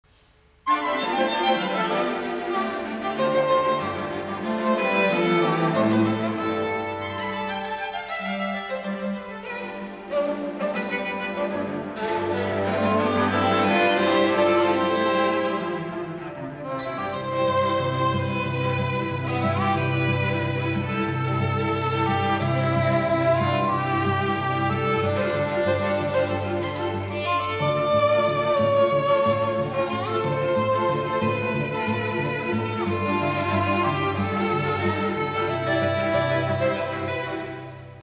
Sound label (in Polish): KWARTET